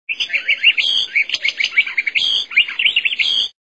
Bird Song

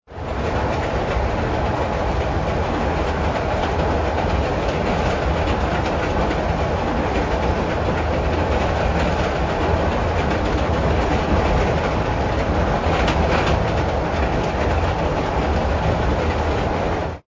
Train Rail Clacks Short; truetones

